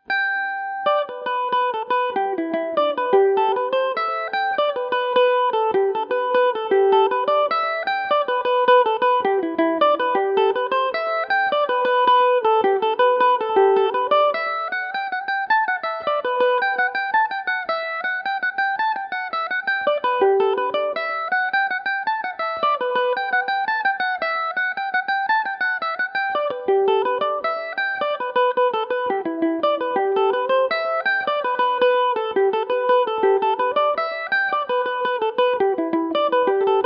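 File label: G major revelation, Irish mandolin; captain mcdonalds favourite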